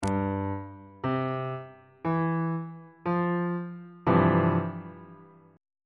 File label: f major 7th suspended 2nd 1st inversion